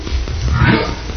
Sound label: HB singing, humpbacks inland WA BC